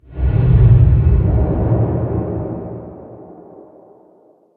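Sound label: underground ambient banging; blackforest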